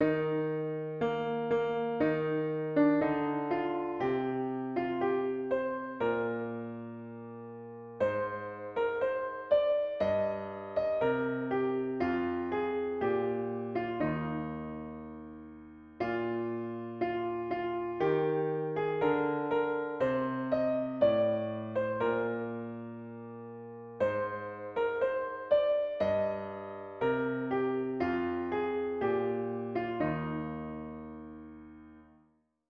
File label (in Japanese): ピアノ